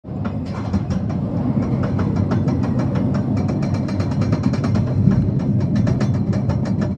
end chain

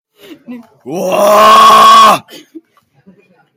rage

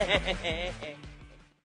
eheehee

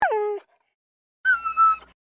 dog whimpering; peter pan

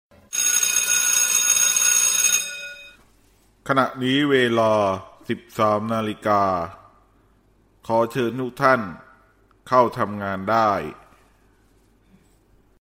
classic ring

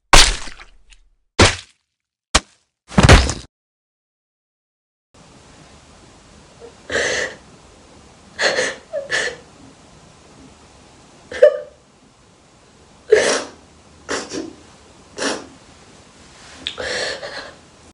stab

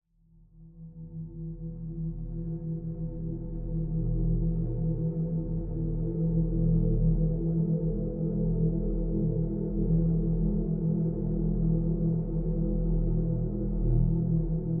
ominous music

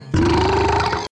drunk burp

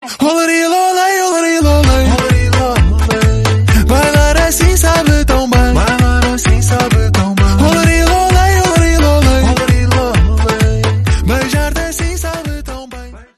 music

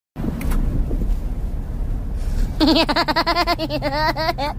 baby grinch laugh

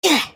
death; roblox

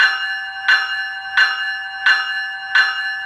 bell sound effect

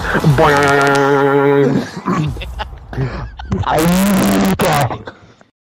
boing awoogah

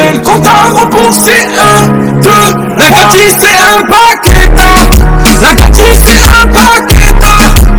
bass

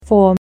trill

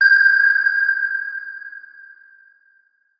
attack

